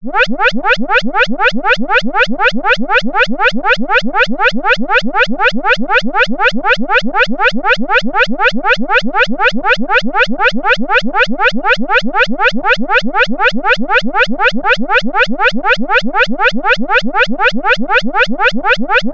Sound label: emergency alarm warning siren; zapsplat